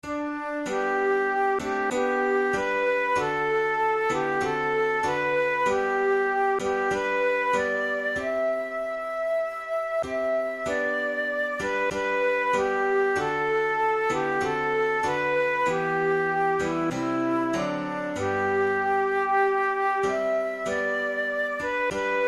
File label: auld lang syne, flute